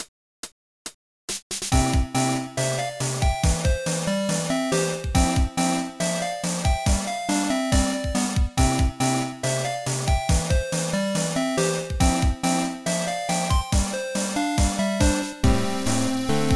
Simple Folk; Gyakuten Saiban; GBA

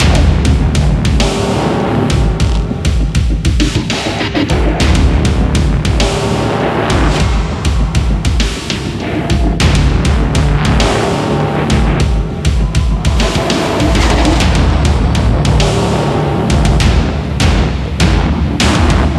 Music Loader; Hellbound

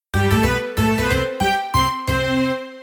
Something Good Ditty

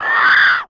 Ant dies squeel; blastmorph pinball; xbox series xs

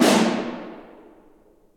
Snare

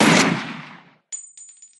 Rifle; MilkChoco

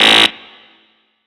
Metal Detector Buzzer; Prison Architect

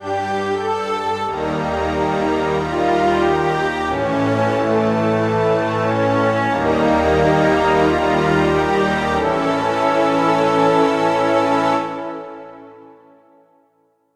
Defogging Music; Samantha Swift and the Mystery from Atlantis

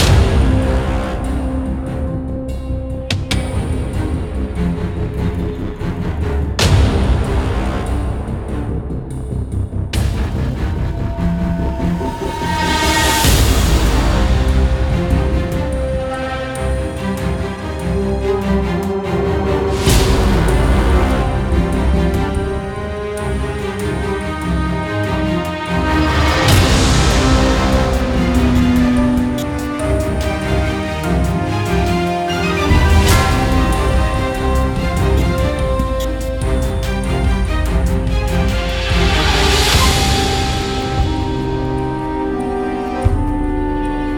bgmusic medium combat; Iron Marines

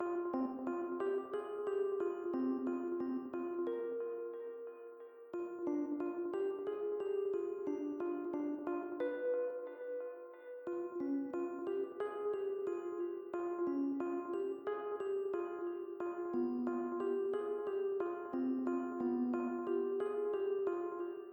intro Rhodes; Toki Tori 2

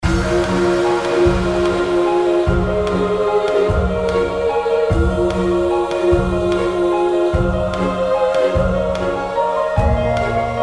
Raid Boss; Mist Train Girls

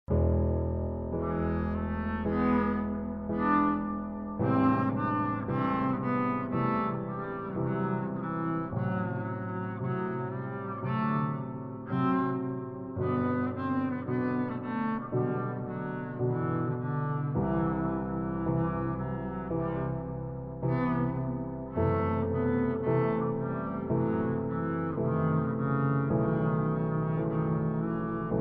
Kill; The Day the Noobs Took Over Roblox 3 gamerip 2022

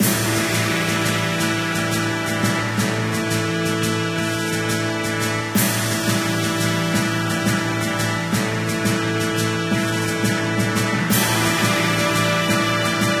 bgm event

